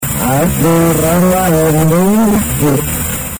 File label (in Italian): ah sopra; Ah, sopra urlare, era venir giù.